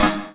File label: G- Synth